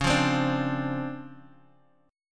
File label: D-Minor 7th, C sharp; Dm7/C# Chord {9 8 10 7 X X} strummed